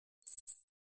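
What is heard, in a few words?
Flash Beep Double Electronic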